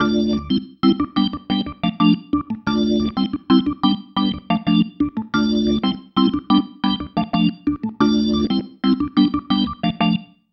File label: Pattern, Organ; Sonic Foundry (Sony Creative Software); Joe Vitale Organ Donor